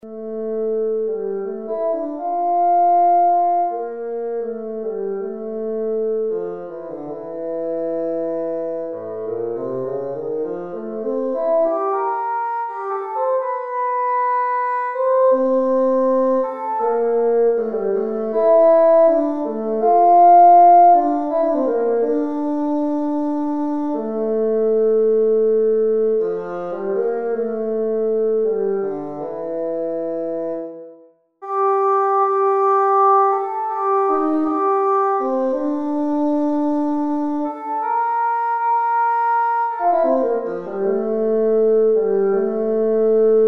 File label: secretary of good fortune bassoon; newcentury